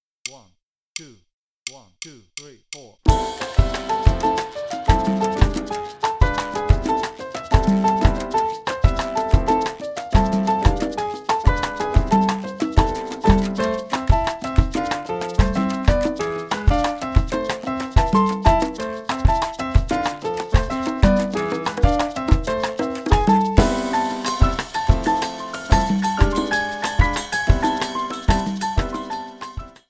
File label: Tumbao, Play-along, Bass; No. 213 Tumbao, Little Havana; Bass Unlimited